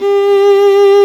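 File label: STRINGS SOLO, Chamber Grp; STR Viola Solo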